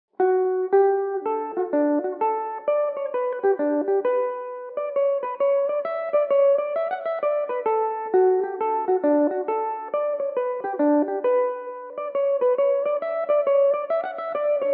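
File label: D major, Irish mandolin; The Ballroom Favourite; Belmuse EM-190 electric mandolin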